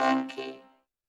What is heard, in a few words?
percussion, Roland TD-20